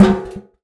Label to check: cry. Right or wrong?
Wrong